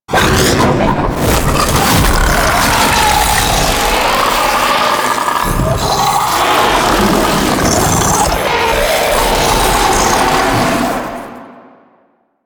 Struggle Start; Warframe; The New War; Archon; Boreal

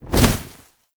Bird Of Prey Wing Flap Down; Warframe

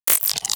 Shotgun Mech; Weapons; Warframe; Tenno; Revenant Shotgun

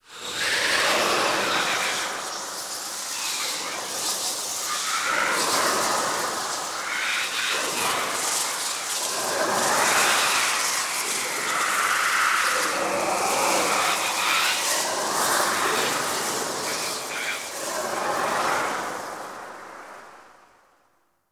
Tendril Wind; Cinematics; Archon Death

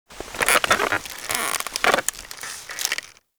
Chair Idle; Lab; Roathe; Warframe; Tau; Protoframe Room; Ambience

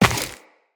Run Dirt, Footsteps; Tenno

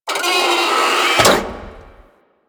Sabotage Fuel Injector Open; Warframe; Missions

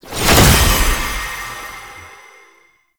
Companion Resurrect; Warframe; Tenno

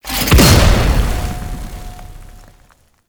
Heavy Stomp Impact; Abilities; Warframe; Tau; Dax Heavy

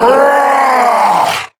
Attack Roar; Warframe; Sargas Ruk Boss

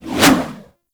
Spear Throw Fire; Warframe; Corpus; Spear Gun

